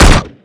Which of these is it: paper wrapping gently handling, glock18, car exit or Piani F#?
glock18